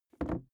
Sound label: briefcase plastic creaky inside pocket; industrie wirtschaft; bueroarbeit